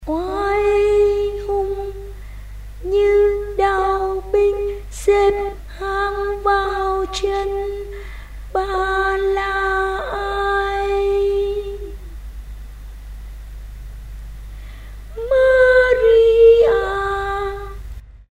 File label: basso